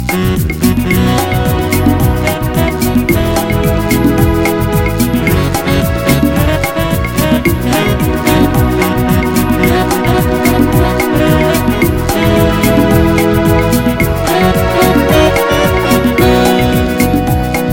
Smooth and Pretty

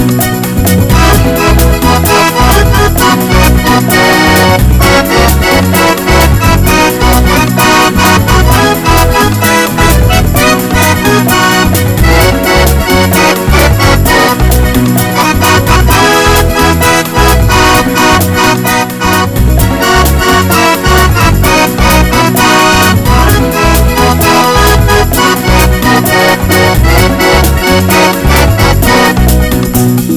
Fast Classic Merengue

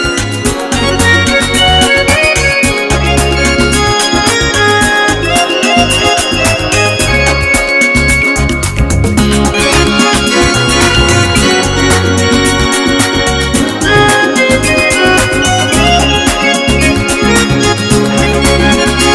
A Super Dance Song

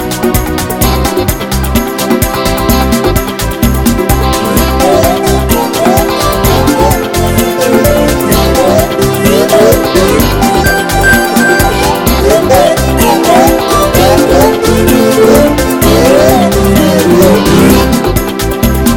Great Dancing Song

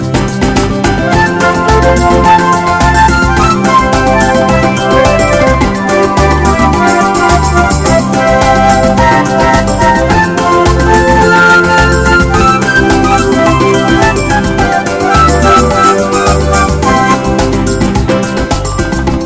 Great Calypso Dancing

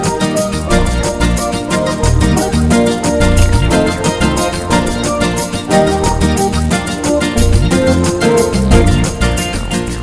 Fast and Hot

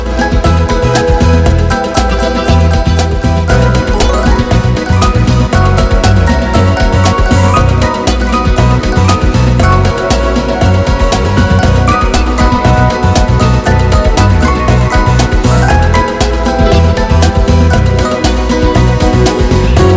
Beautiful Tiger Pop

